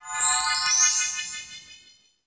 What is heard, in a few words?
Sound magic